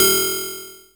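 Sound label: electronic metal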